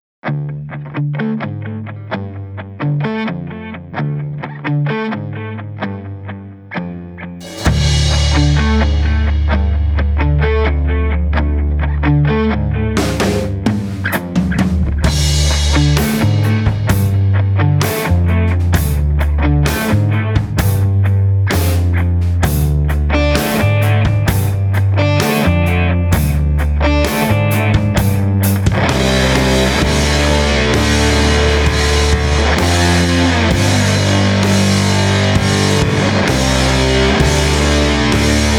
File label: Slow Stoner